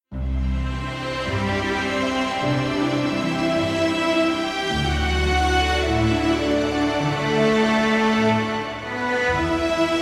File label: cue mystery